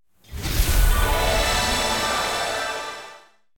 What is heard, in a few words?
random Symbol Transform; fairyhansel